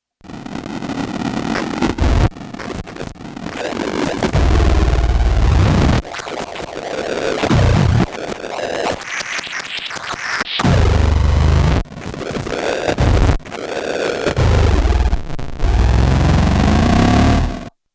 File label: Soft Tune